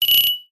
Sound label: multimedia game sound bell ring ping